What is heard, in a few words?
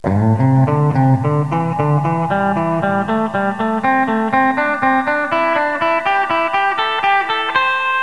triples